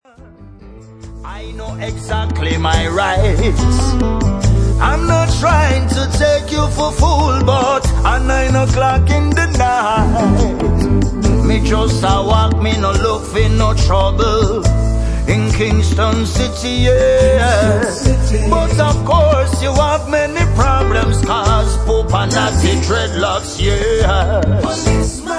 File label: Acoustic